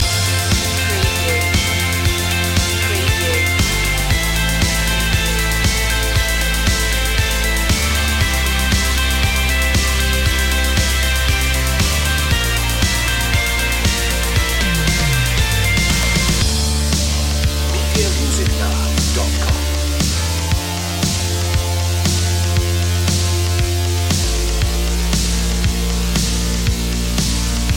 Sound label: electro cute long looping